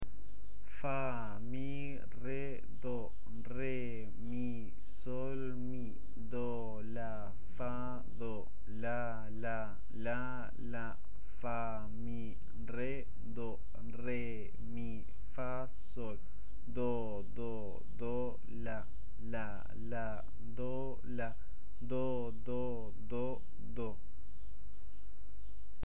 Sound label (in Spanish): solfeo melodia, guitarra